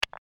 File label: Stone Landing; my cute commissar 2020 gamerip